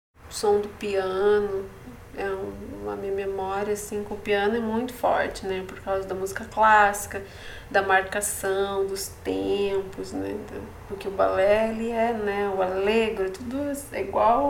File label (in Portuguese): Som Piano